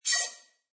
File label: Bell Swell Filter Warble; Scifi Production Elements, Set 2